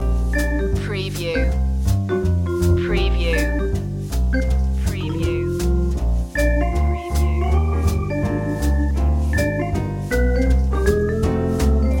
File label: jazzy room loop